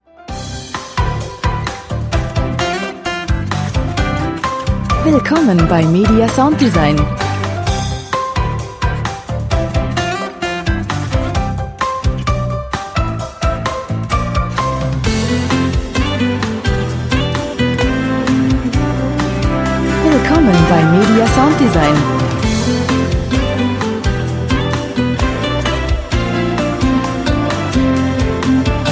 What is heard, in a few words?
pop